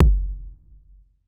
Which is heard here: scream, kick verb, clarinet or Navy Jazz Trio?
kick verb